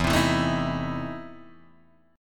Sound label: E Major 7th Suspended 4th Sharp 5th, Guitar, Open G